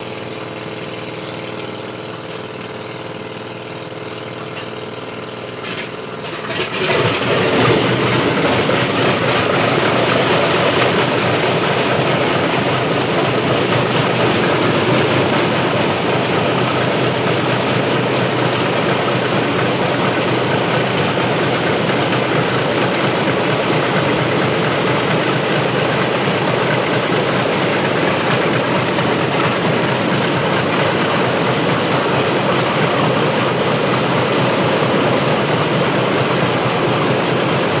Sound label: B-29 Bomber Start, With Start Engine, Loop